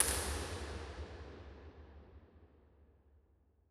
Basilica Dome R, Impulse Responses; Bigger Spaces; Transfiguration; Ableton Live 12 Lite

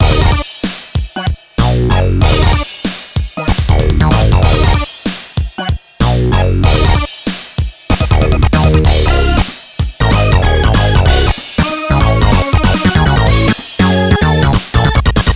Prog Funk

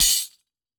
Tambourine Dry Tap; Drums

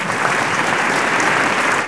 applause; Game Maker 8

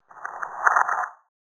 E-Perc Train Track, Electronic Percussion; Drums; Ableton Live 12 Lite; Core Library

